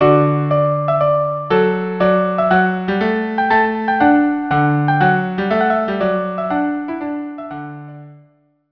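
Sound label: violin cello; La Majeste telemann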